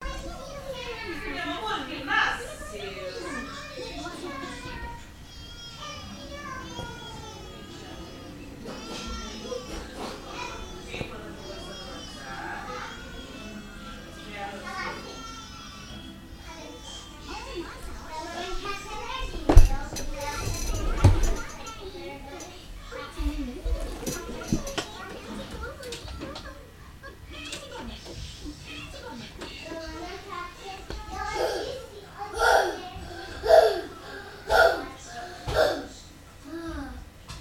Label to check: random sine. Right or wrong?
Wrong